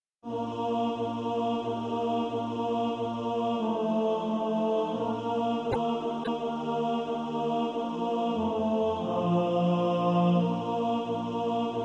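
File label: tenor, Practice Music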